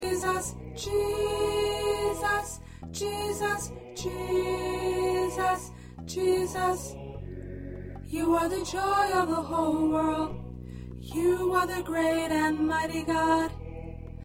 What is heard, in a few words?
Alto, choir parts